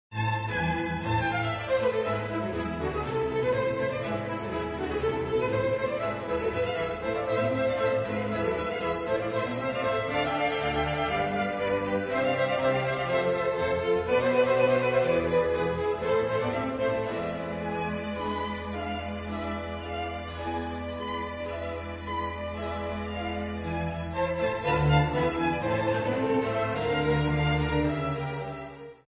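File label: Violin Concerto 8 Vivaldi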